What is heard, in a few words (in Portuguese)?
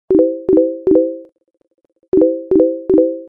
toque do chat do facebook